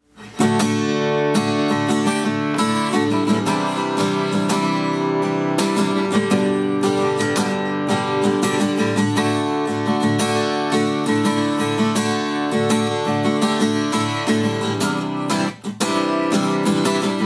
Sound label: Yamaha guitar